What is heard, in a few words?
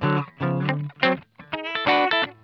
BLUE, Funk Guitar; Zero G - Funk Guitar